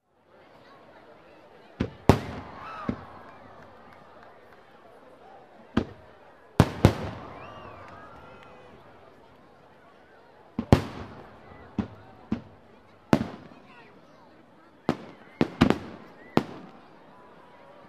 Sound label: Shots of fireworks and the noise of a crowd of people; explosions